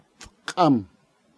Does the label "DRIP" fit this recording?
Yes